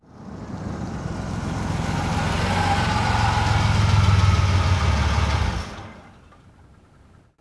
prijezd; Mafie 1

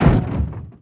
sjezd; Mafie 1

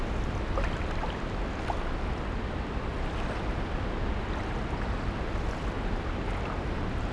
reka; Mafie 1

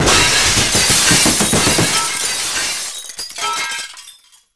sklo; Mafie 1

